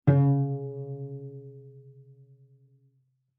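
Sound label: Cello pizz fortissimo sul G Db4; MIS Pitches - 2014; Strings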